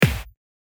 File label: punch